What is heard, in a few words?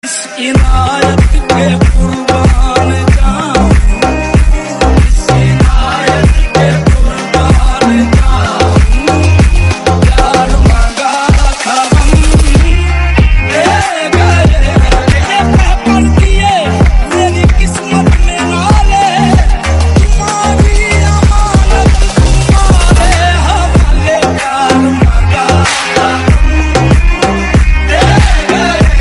pakistani tone ringtone